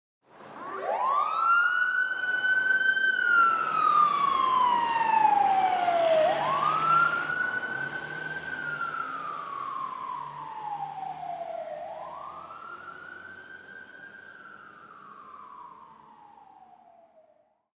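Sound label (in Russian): пожарная машина проезжает с включенной сиреной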